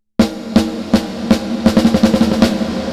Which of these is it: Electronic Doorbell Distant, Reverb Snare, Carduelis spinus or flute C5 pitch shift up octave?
Reverb Snare